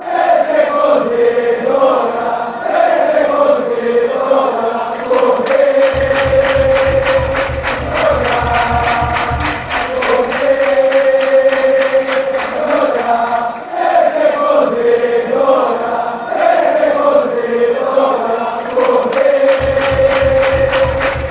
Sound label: with you, fan chants